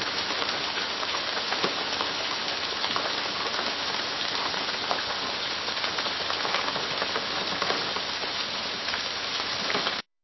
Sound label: rain on a roof